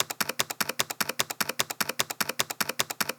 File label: Percussion Loop, Percussion Foley, 150bpm; Dubstep; Percussion Foley Loops; Ghosthack; Dubstep Sound Arsenal